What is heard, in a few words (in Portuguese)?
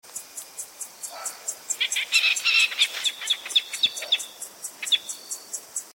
falcão peregrino; birds